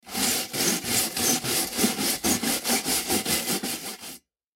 Wire Brush Scrub Metal Fast; Hand Tool; Industry; Blastwave

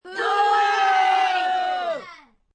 Group Boo, Boo, Group, 3-5 children, single; Children Sound Effects

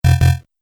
Multimedia Lo-Fi Error, beep; Lo-Fi Error With Delay; Beep Sound Effects

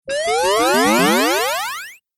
8 Bit Style Transform; Video Game Sound Effects

